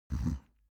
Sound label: human mouth sniff grunt; smartsoundfx